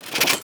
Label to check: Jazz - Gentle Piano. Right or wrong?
Wrong